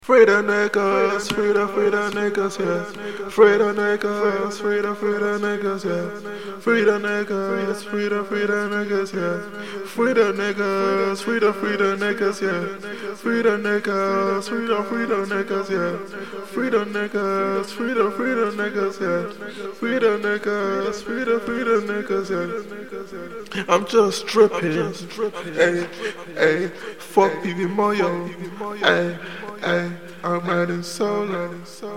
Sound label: Acapellas, trap 146 Bm